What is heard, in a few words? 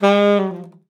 sax g#3, Saxophone slides; Best Service ProSamples vol.42